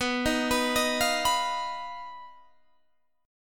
B Major Flat 5th B Guitar Standard; BMb5; arpeggiated; 19,18,21,20,18,19

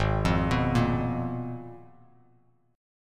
Ab Minor 6th Add 9th, Ab, Bass Standard; arpeggiated; JGuitar

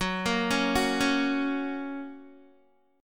Gb Major, Guitar; Listen to Gb arpeggiated

